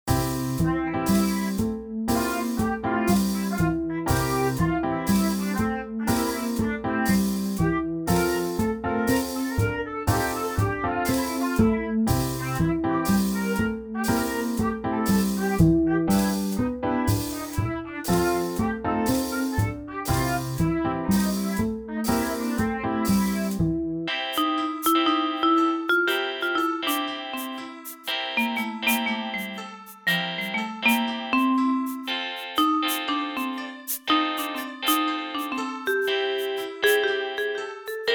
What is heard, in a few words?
midi blues; spellman studio